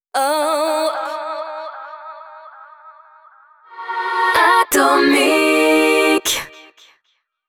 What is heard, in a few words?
JINGLE acap; ATOMIC; HABILLAGE ACAP